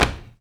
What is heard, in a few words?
Body Perc